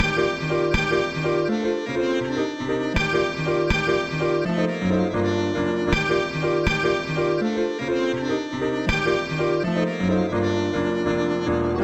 chops

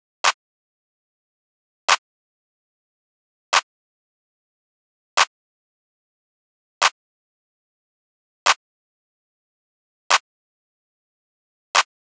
clap Emn 146bpm

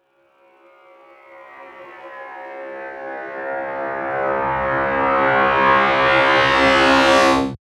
EFX

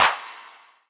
Drums Clap

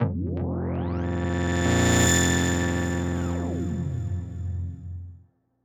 Fm Analog Fx

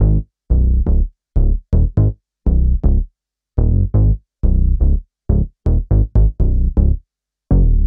bassline 120 B6add9

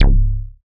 C Lazer Bass

